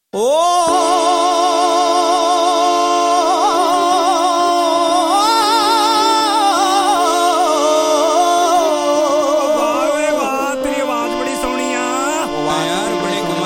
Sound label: Jingle Bells; Hide And Seek (2010)